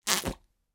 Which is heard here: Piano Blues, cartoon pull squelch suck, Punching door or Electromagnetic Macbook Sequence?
cartoon pull squelch suck